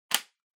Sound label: Power Strip Adaptor Hand Hard Plug In